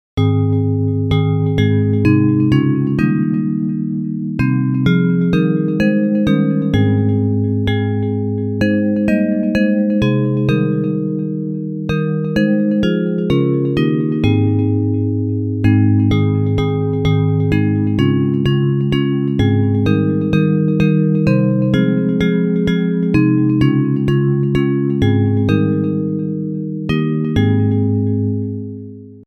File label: Bells